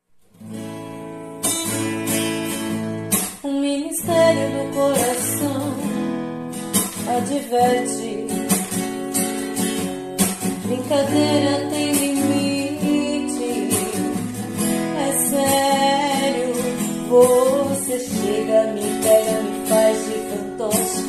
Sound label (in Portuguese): acustico voz violao